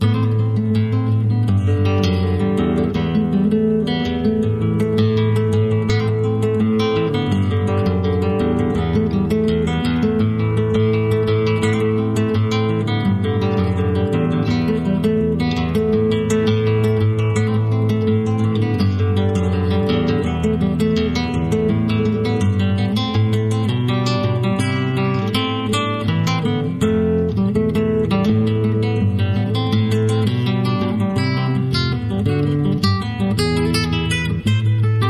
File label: (Acoustic)